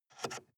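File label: sports car interior ventilation adjust slide soft; verkehr transport; autos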